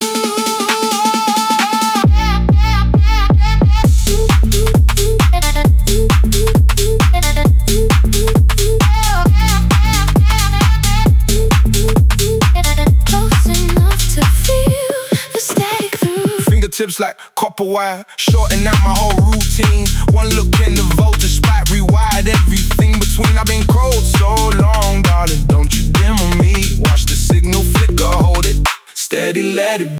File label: hook mixed